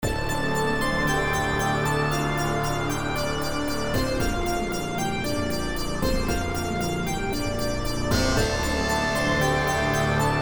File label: String Trio; Hibernatus